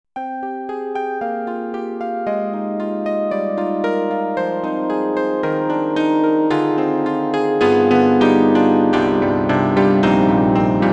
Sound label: EDIROL SD-90, Solo, SA Piano